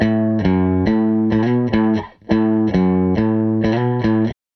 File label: Gretsch Guitar Loop